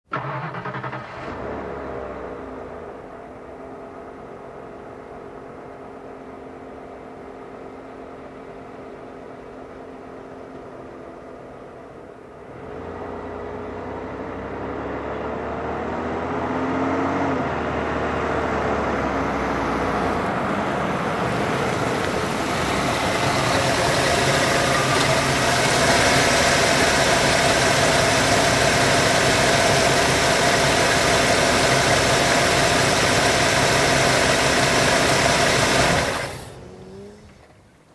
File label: Car parking close